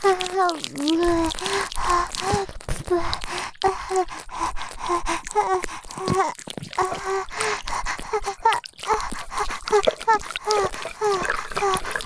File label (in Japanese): 射精 びゅるる; 射精と潮吹きおしっこ; 25,52,69,95秒後